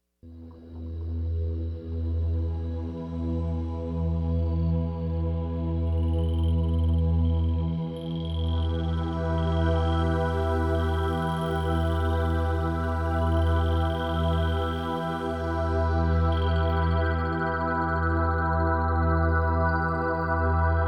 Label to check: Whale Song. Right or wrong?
Right